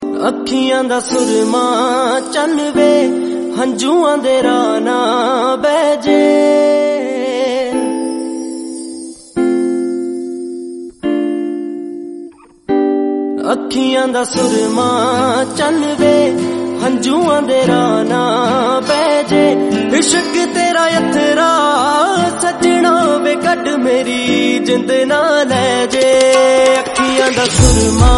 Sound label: punjabi ringtones are very heart touching